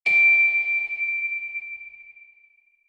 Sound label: Faint Hi Bell Ping